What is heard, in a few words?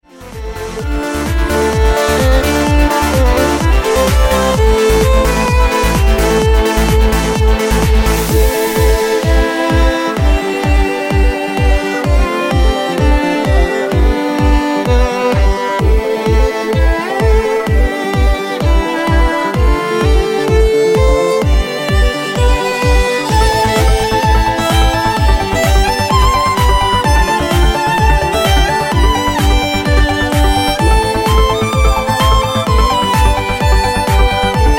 Minimal Beat; 纯音乐